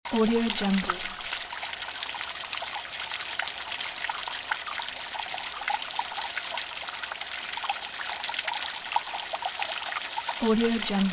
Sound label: spring of water loop